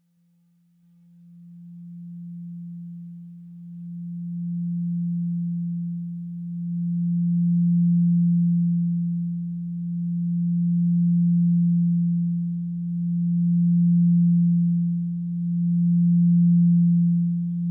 singing bowls; Yoga and sound

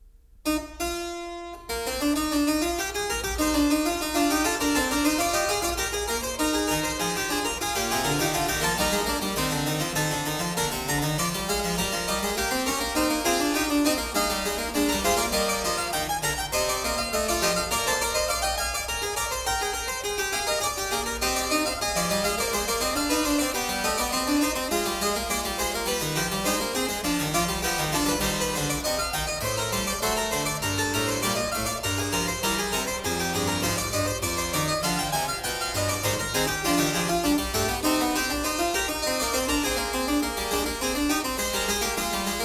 Fugue; Fugue 5; BACH; WELL-TEMPERED CLAVIER; WTC 1

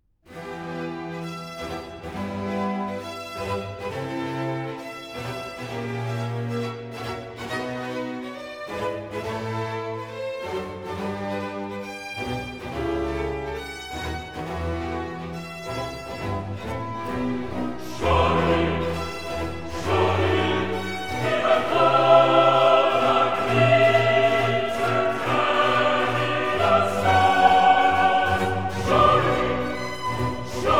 Chorus; Surely he hath borne our Griefs; HANDEL; MESSIAH

